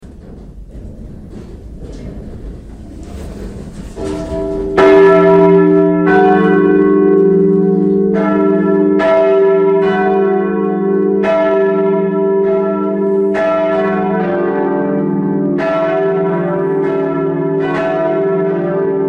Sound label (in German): Glocken; Stiftskirche